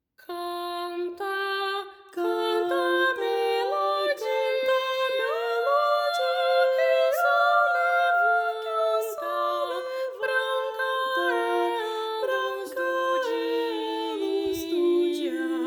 Canon a duas vozes; O Pequeno Cancioneiro

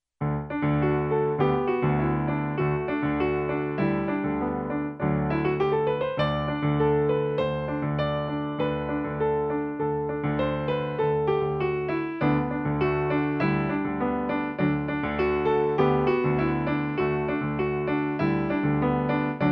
Cantoria dos bichos PIANO

